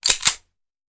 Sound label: Hand Gun Foley; Sound Ideas; Guns